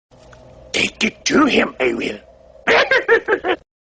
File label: yoda laugh